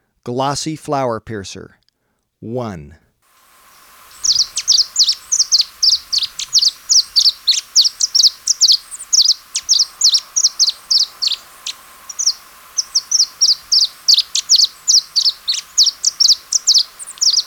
Glossy Flowerpiercer, Birds of Ecuador; S. America

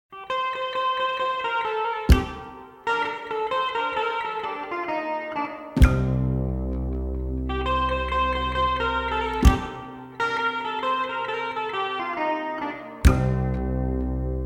Desert Blues

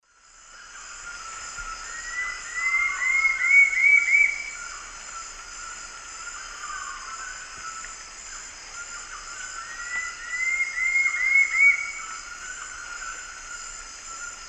Campylorhamphus procurvoides, song; S. America; Eastern Lowlands

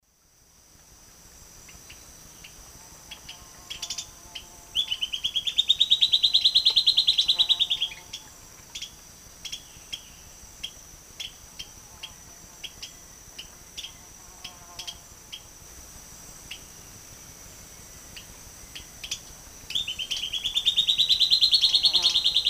Sclateria naevia, call female and song male; S. America; Eastern Lowlands

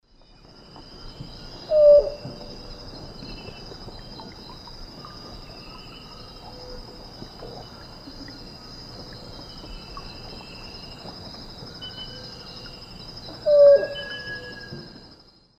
Zebrilus undulatus, song; S. America; Eastern Lowlands

